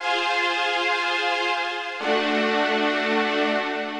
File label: Strings (120BPM), retro house